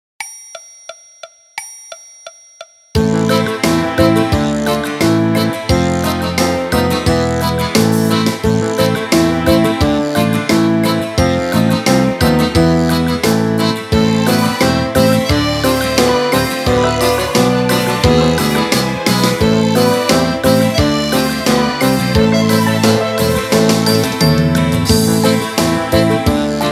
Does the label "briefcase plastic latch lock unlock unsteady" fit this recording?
No